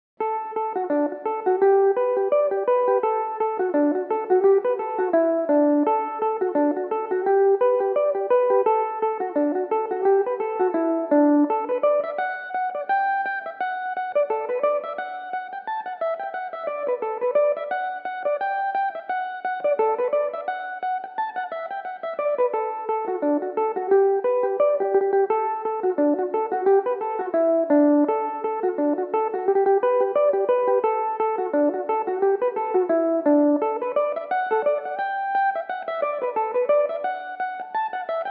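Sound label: D major, Irish mandolin; rolling in the ryegrass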